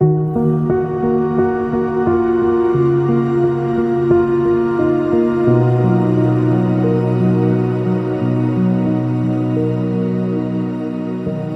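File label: Whispering Tides, ambient piano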